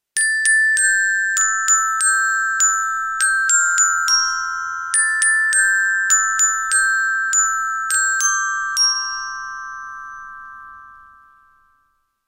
dzwonki; Nagrania Lokomotywy; edukacja wczesnoszkolna

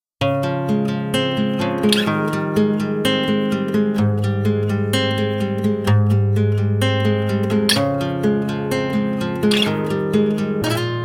gitara klasyczna; Nagrania Lokomotywy; edukacja wczesnoszkolna

